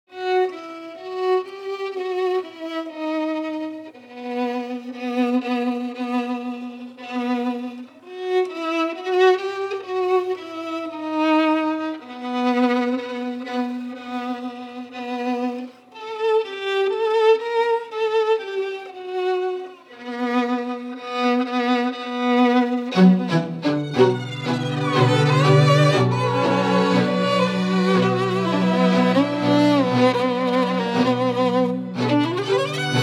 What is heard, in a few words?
Strings End Titles; Lady in the Lake